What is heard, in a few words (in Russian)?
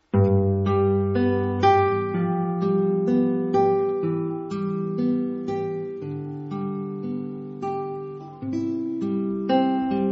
гитара; В.Смирнов; Апрель